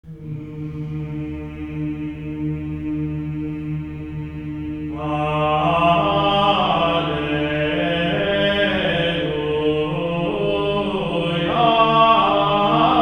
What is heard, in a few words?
GR ALLELU, Symphony of Voices; Spectrasonics